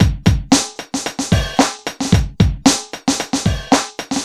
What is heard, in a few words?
Urban Beat, Drums